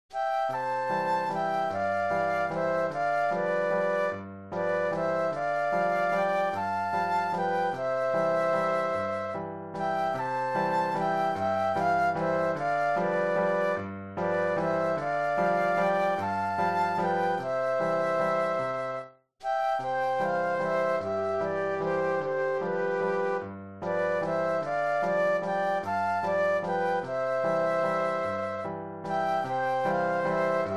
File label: Flute Duet and Piano; classical